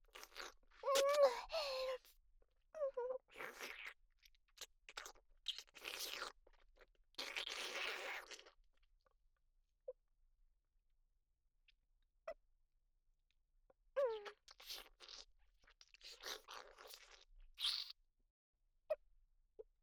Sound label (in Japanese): フェラ・精液を飲んでいる声; 生意気少女系カノジョ